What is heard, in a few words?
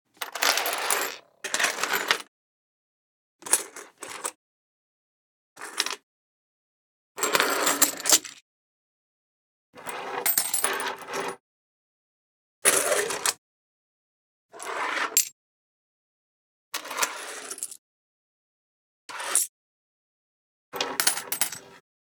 Coins Wood Slide Gather